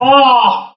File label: "ah"